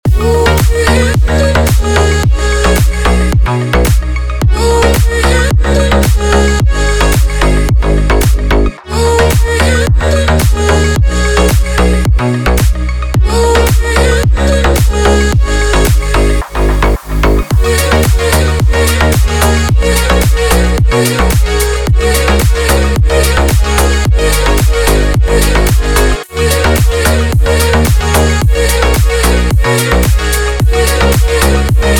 slap house; feel it

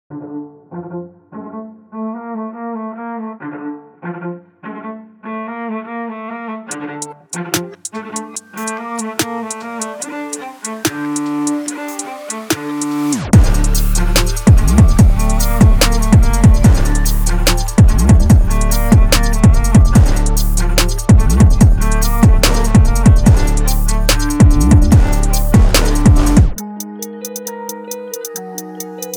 trap sample pack; mystery

